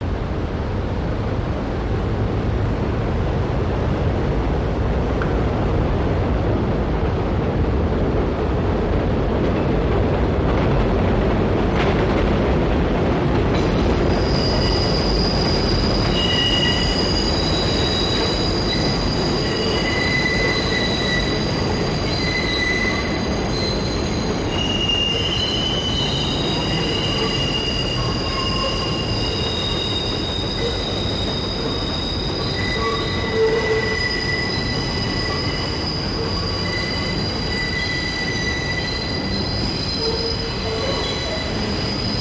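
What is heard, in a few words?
El Train In Stop Screech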